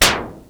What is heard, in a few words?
snare, Kawai SX synths